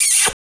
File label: 8-bit Smootch